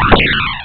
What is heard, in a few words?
ball Shot; Pararena 1.3